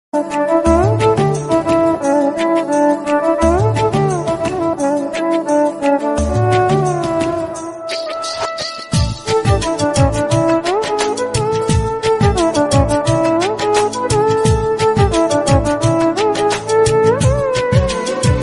romantic instrumental bgm ringtone

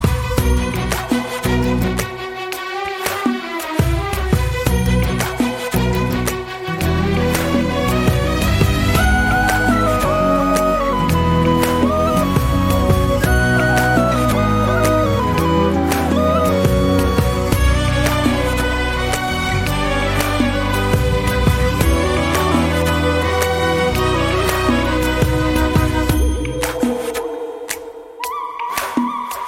Love BGM; Laabam

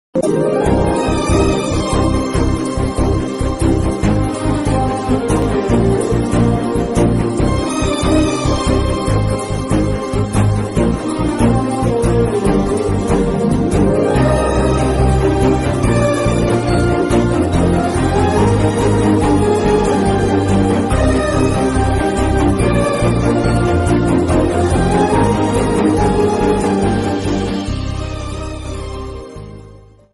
movie bgm ringtone romantic ringtone music; majili; naga chaitanya; samantha

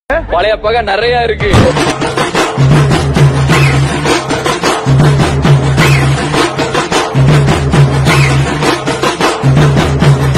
rowdy bgm; rowdyism

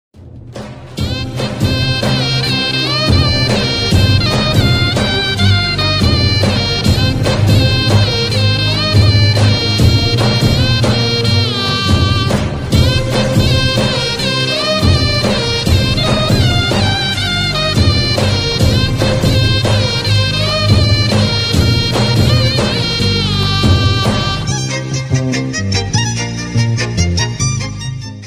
sontham bgm bgm love bgm flute bgm heart feel bgm

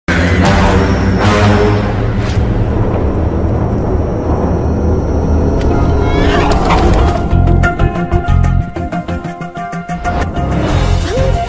trailer bgm; virupaksha; virupaksha movie

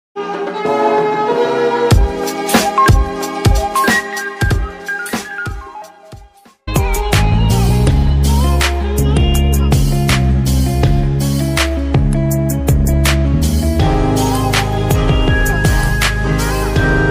ringtone instrumental remix

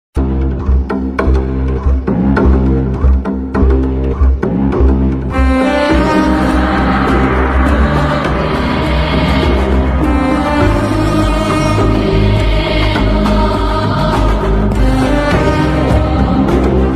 dark side intro bgm ringtone; asur; voot web series

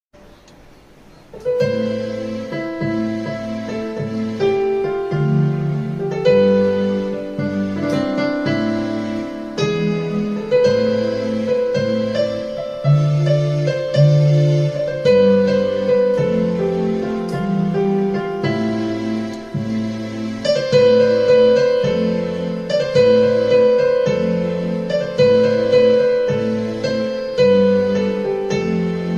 bgm telugu

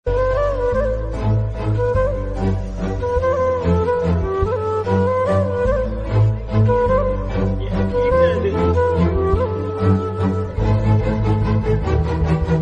movie bike bgm best love bgm

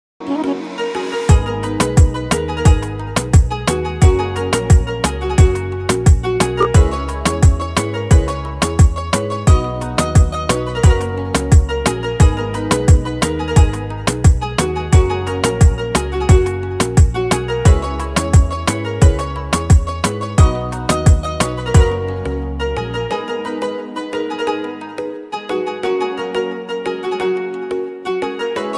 instrumental

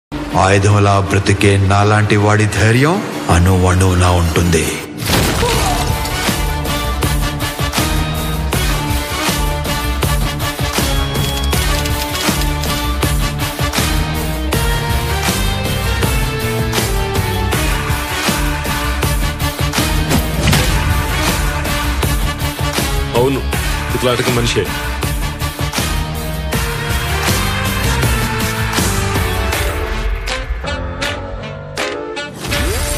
bgm ringtone, telugu movie; ramarao on duty

